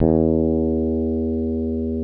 E2, basses; Greytsounds VOL-1

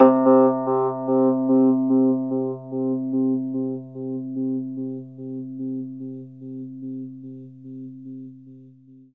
Rhodes octave C, R; 3D Sound; 3D Keys VOL-1

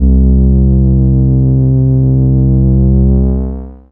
Single Electric Basses, Dance Floor Bass

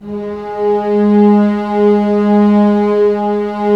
STR SEC, Roland LA Composer, Sec Mute St; Roland - LA Composer vol. 1

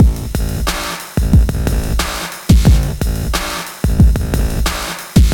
beasty beat, 90bpm

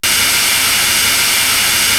TV Noise, Korg TR-Rack

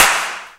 Clap, Roland JX-305

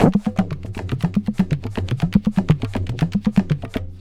tabla, 120 bpm; Zero-G; Pure Tabla

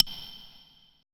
E HIBLOCK, ETH PERC

